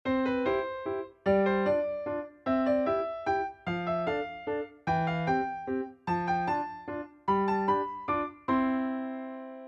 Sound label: chromatic